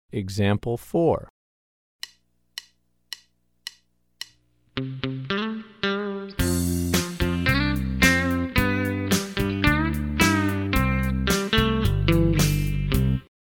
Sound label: Country Rock